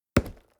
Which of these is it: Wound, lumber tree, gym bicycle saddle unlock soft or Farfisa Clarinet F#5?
lumber tree